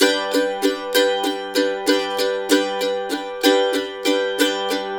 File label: RIFF, Blues Guitar Samples; ILIO; Fretworks; 90's Sample CDs